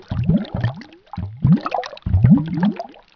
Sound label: river bubbles